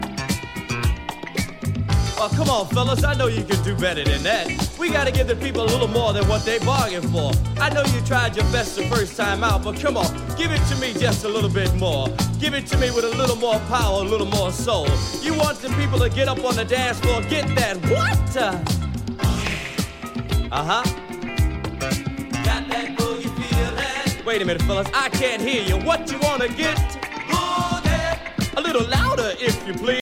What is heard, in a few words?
boombox